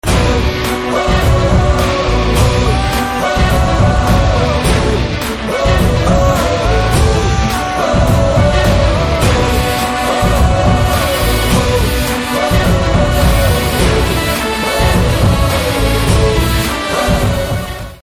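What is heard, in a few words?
background humming tone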